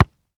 Ball Impact; Physics Ball Impact A

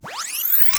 Tape Start

